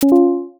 comedy soft laugh cue

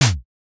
sand step soft

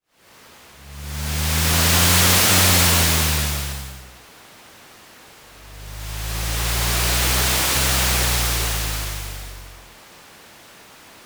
highway pass

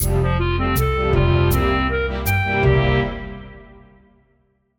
jingle cooking segment

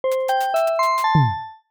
logo kids craft spark

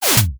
zoom snap

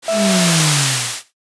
smoke dissolve

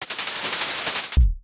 static blackout; horror sf

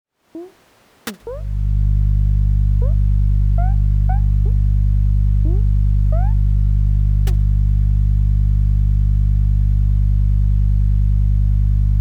refrigerator hum

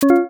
camera flash pop

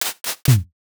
card hand deal

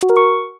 answer correct

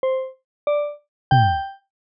countdown three clean; buttons ui

